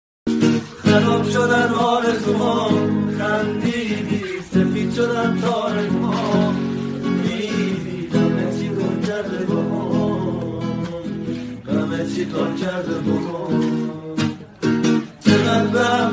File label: Guitar